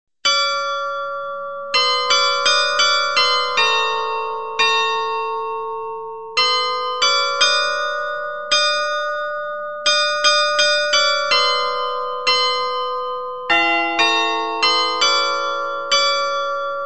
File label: Barka